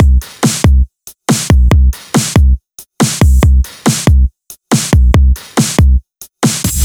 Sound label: Break Beats, 140 BPM